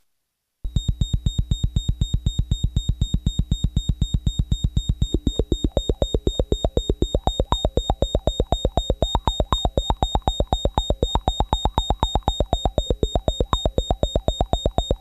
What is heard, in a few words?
twang; Scott Stites